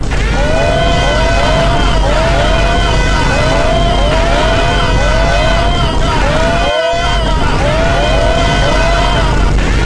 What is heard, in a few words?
alert